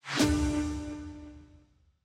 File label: battle end watcher